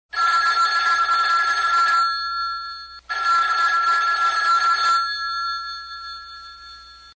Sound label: Telephone, ringtones